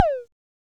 normal hitwhistle

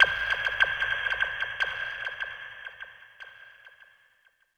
soft hit whistle

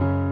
piano low

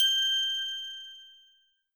bell

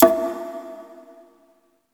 drum hit clap

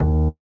bass D#3

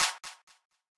clap all purpose; osu

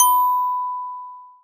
B5 bell

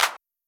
Drum Clap

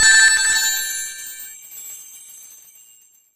combo break

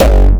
Tritone Kick

